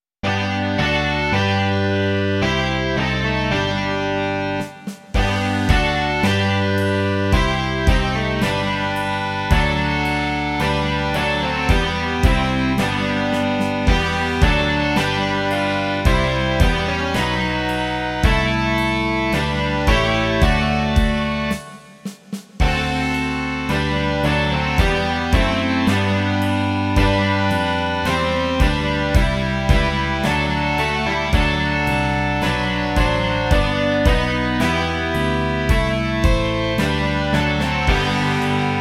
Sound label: rock